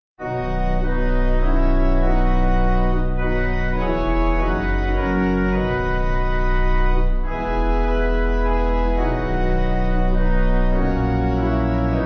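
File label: Pipe, Pipe Organ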